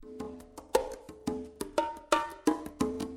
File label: Congas 130; Logic